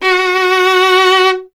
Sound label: STR VLN, Vln Detache; Miroslav Vitous